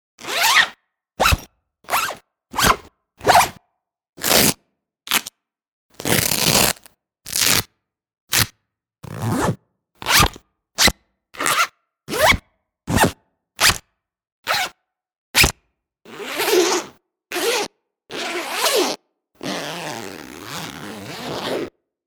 Zips And Velcro, Various zipping and Velcro sounds; Zippers; Objects